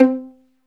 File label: STR VL PIZ, Chamber Pizz; Roland L-CD702